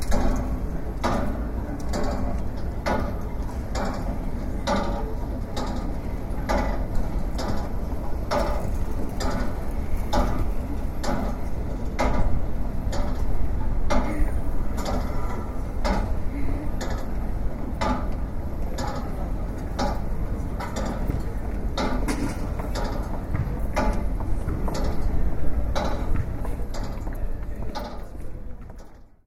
corpus clock aka grasshopper clock corpus christi college cambridge